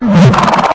alert, ministrider; npc; source engine; episodic

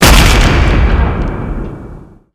powerup pickup knockout melee hit; Source Engine; tf

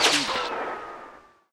scout jump; video games; source engine; tf

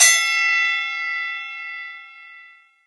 scored

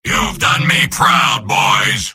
cheers; soldier; video games; source engine; tf; mvm

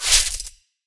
cloth foley; player

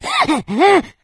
become enraged, infected, male

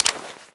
smg full auto button; Left 4 Dead; Source Engine

